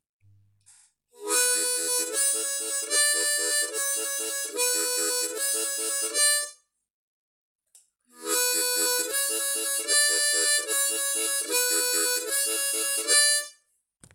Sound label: tongue triplet, harp